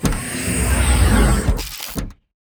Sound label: door open